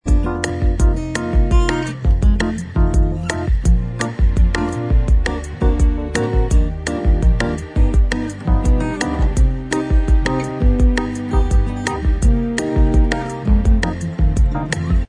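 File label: bossa version instrumental Track, backing tracks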